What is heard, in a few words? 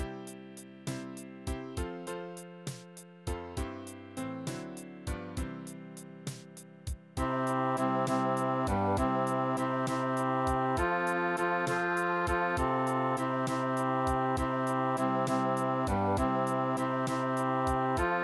Bass